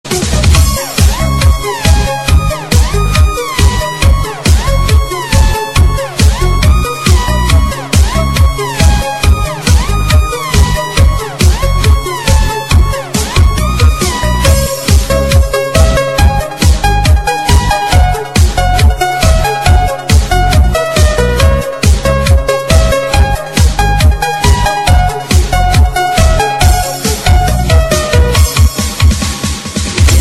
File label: shaker dance